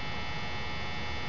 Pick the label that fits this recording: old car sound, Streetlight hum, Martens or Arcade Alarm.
Streetlight hum